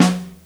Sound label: SN Mono, Snare